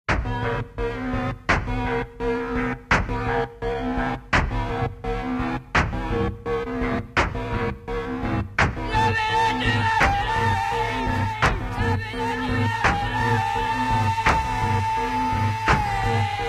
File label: Industrial music